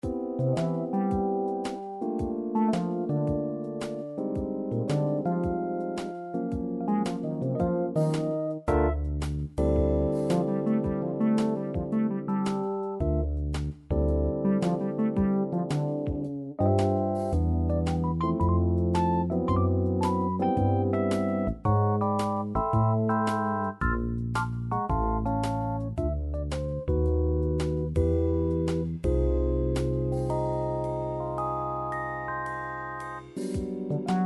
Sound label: jazz one step, midi